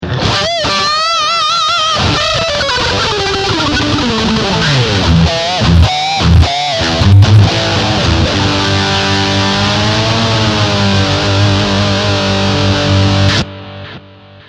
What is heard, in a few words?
Lead